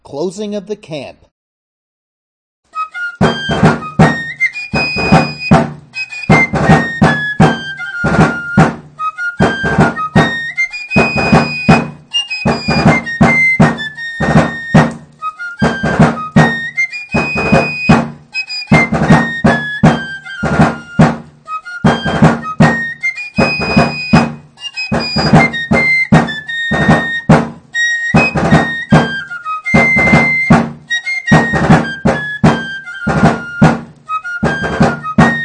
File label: Retreat (Close Camp)